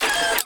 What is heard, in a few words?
Menu Scifi